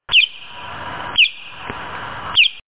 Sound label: hornit chirp